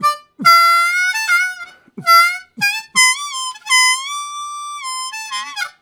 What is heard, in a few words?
Med Bending, Harmonica; Zero G